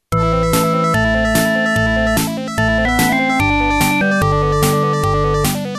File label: MZ-2500; Laydock